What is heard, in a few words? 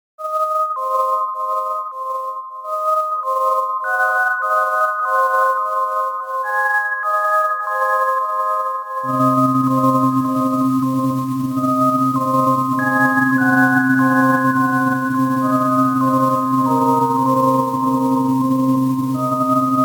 My Defect Synth CS80V